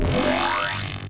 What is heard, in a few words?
laser charge up stronger; Futuristic Weapons Sound Effects